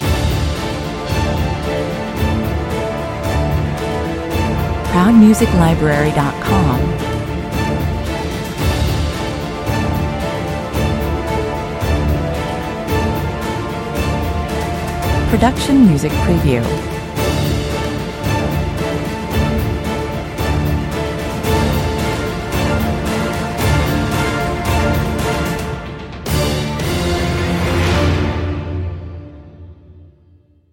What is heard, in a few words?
no choir